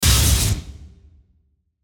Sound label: Hit Industrial Processed Mechanical Stamp; Production Element Imaging Element Accent Transition